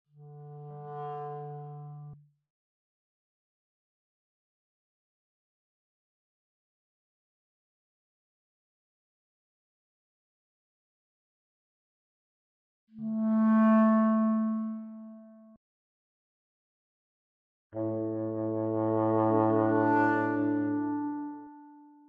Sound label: Camp Wind